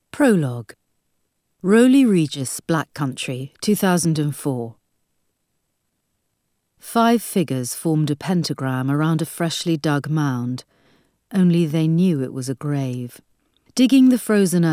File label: Silent Scream